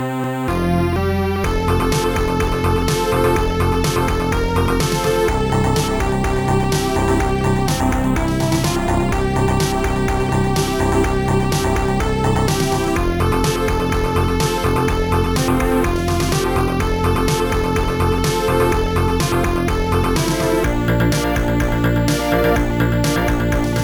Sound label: bossa nova; Trackers; Modland